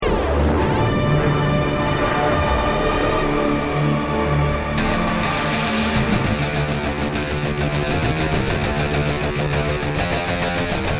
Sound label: start round, music; halflife alyx